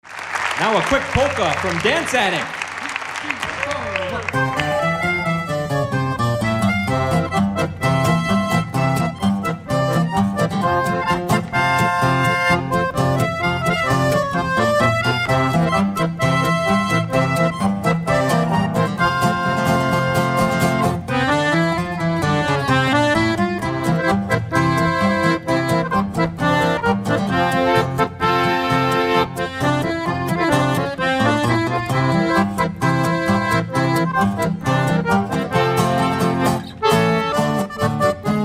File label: Song Polka